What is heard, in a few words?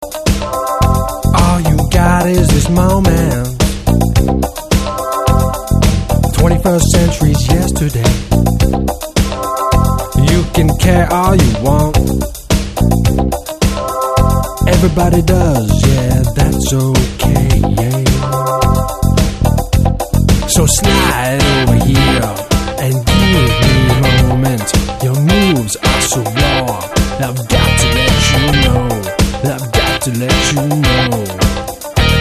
Steel Strings